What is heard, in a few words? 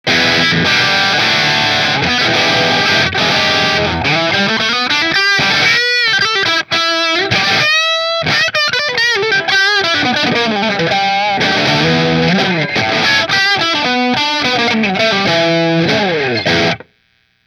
Mcnaught bridge marshall, guitars